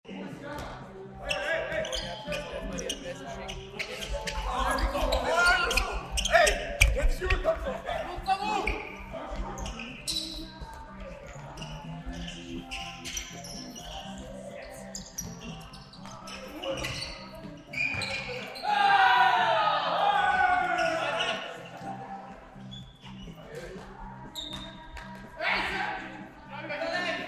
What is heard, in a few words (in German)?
Fussball Atmo